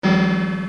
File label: door open